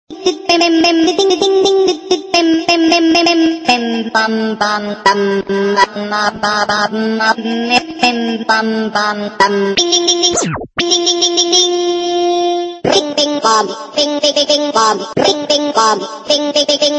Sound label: super crazy sounds